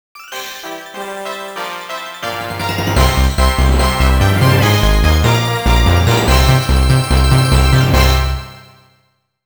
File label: You Beat Bowser; mario party double screen ds wii u gamerip 2007